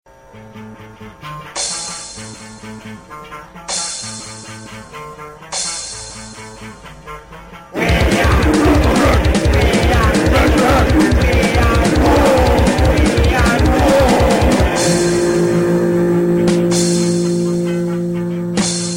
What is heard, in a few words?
gore core